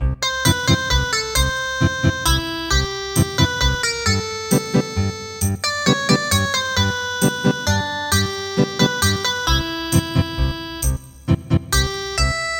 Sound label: Ethnic Guitar